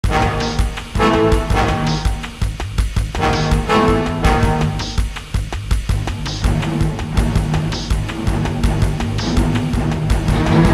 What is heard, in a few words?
Laser Drone Appeared; ben 10 protector of earth ost